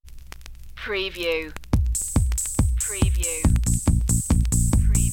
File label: short vintage dance cue loop intro